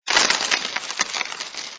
Tree Up Level; Blockman Go; Android

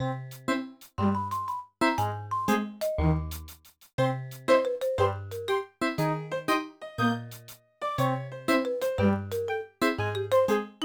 Opening Looping Music; I Spy Fun House

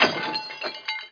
Crash; Buggy; PS1

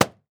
balloon pop

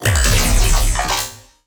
Lasrgun Electron Impeller Charged Fire

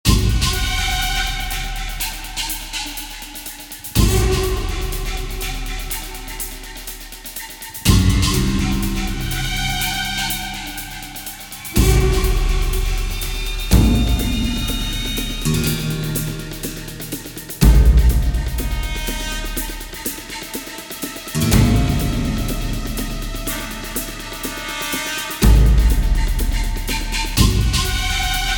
Action Loop

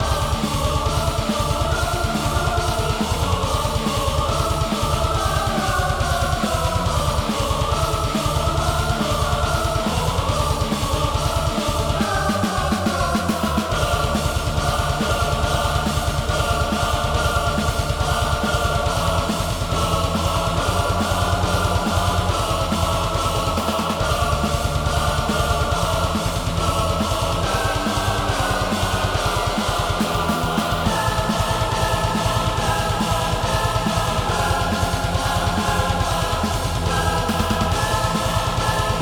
Epic Layer; Hollow Knight; Grimm

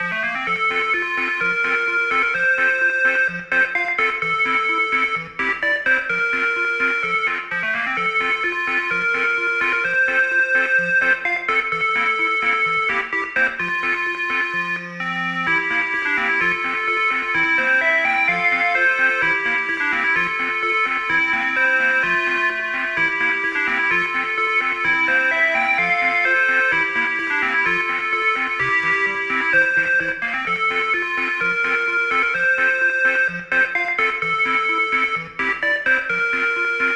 Bgm Prize; Fist of the North Star Lost Paradise; Hokuto ga Gotoku; PlayStation 4 gamerip

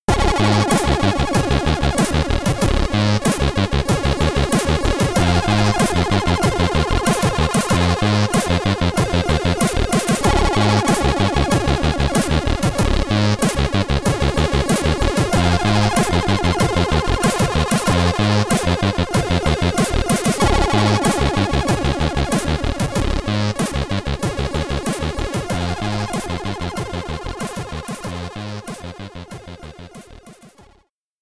Loading Tune (SID Stereo), commodore 64; Mega Starforce

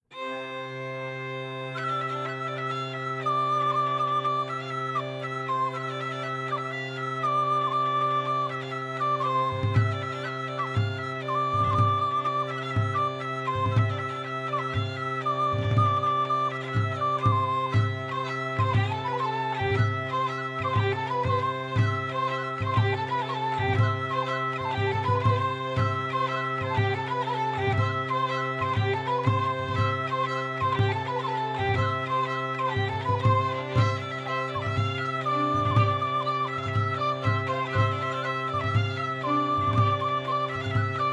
Tavern; Assassin's Creed Unity

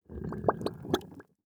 mud fart bubble slow

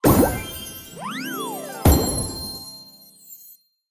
Bear Bubble

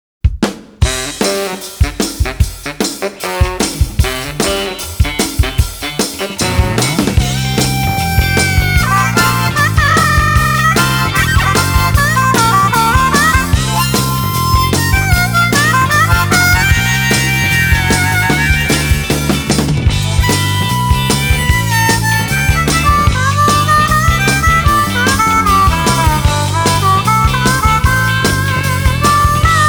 blues harp action

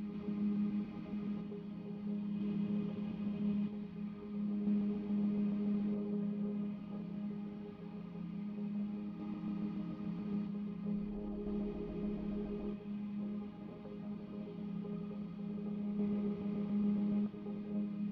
Tension Synth Augment

